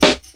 Good Hip-Hop Snare F# Key, acoustic snare sound tuned to the F# note